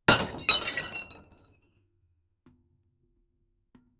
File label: breaking an earthen pot, ceramics